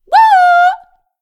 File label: Props Red Dress Woman Appears; Rampage